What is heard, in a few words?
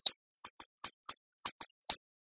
Glockenspiel